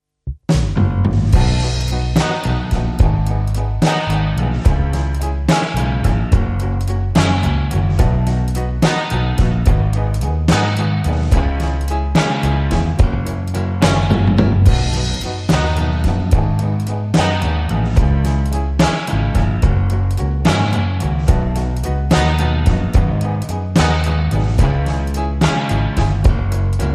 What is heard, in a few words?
Instrumental